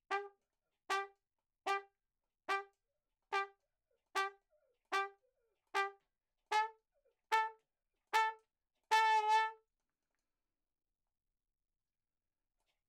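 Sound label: RAGTIME trumpet room L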